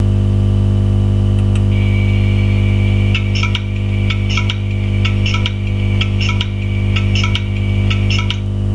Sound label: clicking